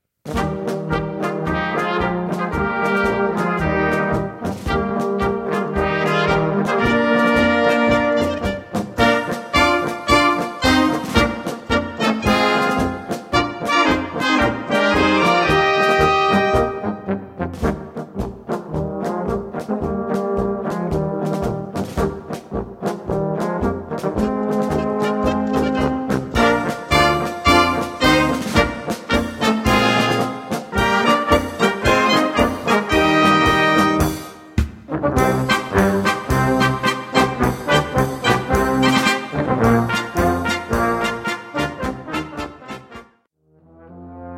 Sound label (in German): Polka